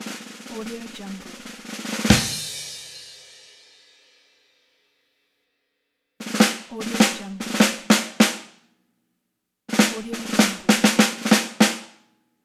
the drum roll; blackdragonfx

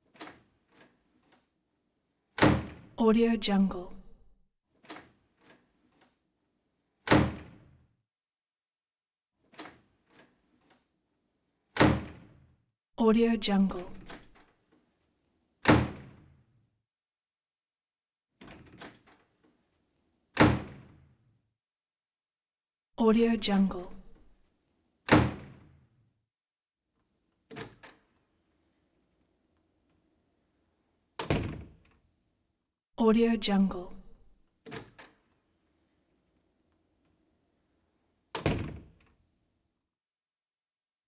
wood door open and close echoey; prosoundfx

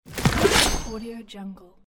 pull sword out of wood

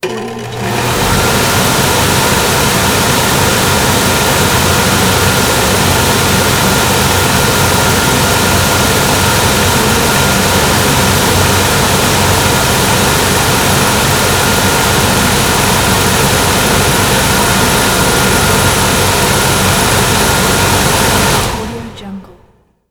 blower fan operation; electronplanet

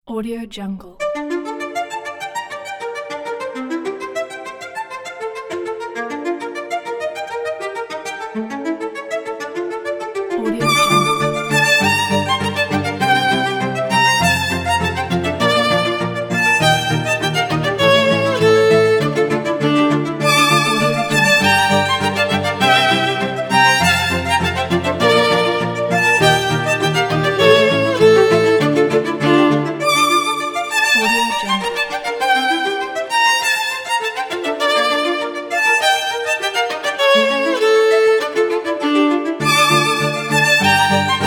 emotional classical violins cello

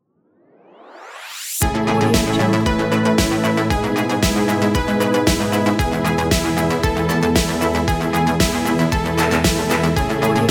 vintage logo; teasound

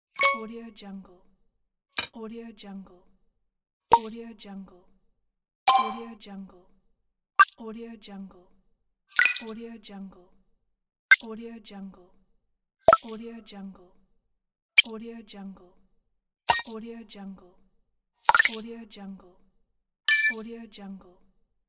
retro game coins collecting

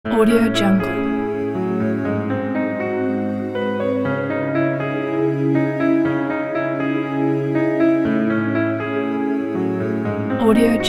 sensual tune kit

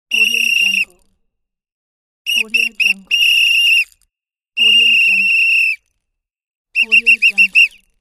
referee whistle

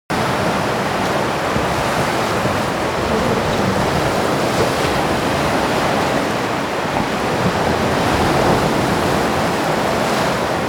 atmo sea surf stormy sea splash

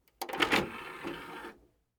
dvd play motor spin up; prosoundfx

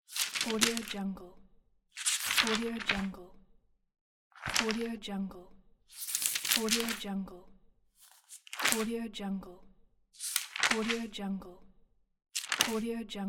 magazine pages flipping

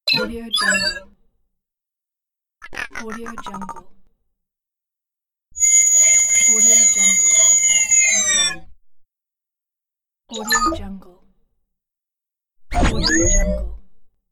futuristic fx

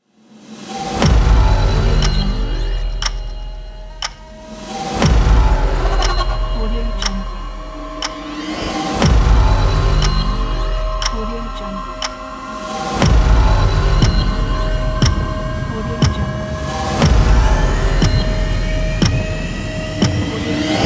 countdown trailer ident

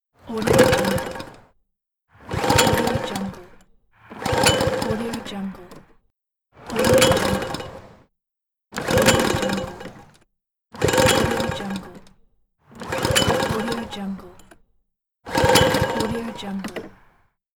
failed start lawn mower

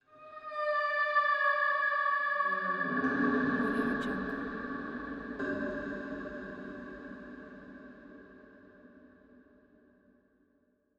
creepy horror sqeaks and creaks

